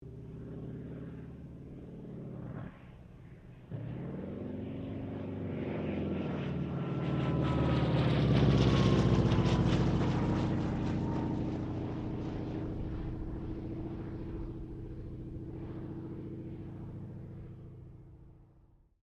AIRCRAFT T-28D TROJAN PASS; Airplanes

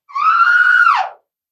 Female Monster Scream Harpy

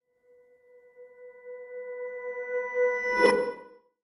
Accent Reverse Hit; Miscellaneous Musical; Blastwave

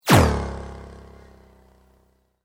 Sweep Mechanical Fast

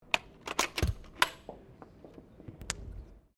GUN TOY DART SHOTGUN UNLOADING

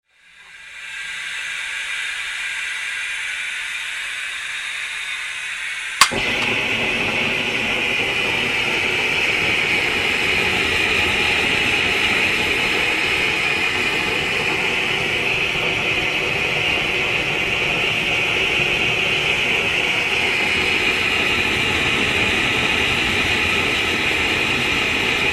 Gas torch burner light burn then off; Flames

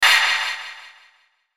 Hit Airy Burst Pulse; Blastwave

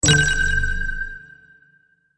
Sci fi Data Interface Sound

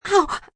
Pain Ow Gasp, Pain, female, short

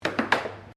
Air Hockey Rebound Triple, Air Hockey table; Games

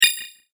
multimedia rollover, Multimedia Rollover Tone; McKinney Sound

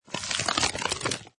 Scifi Static Escape; Suitable as science fiction film and game production; Scifi Production Element - Static Escape

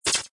Laser Blast Electronic Short Burst; Scifi Production Elements, Set 2; Lynne Music

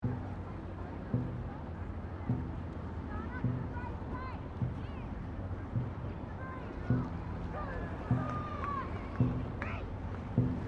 BOATS DRAGON BOAT PADDLE PAST, Dragon boat racing; Vehicles

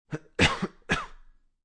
Human, Coughing, Male; Sick

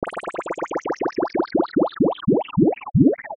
Descend Digital Bubble Bursts; Descending Sound Effects; Descends (Sound Effects:Sound Design); Blastwave

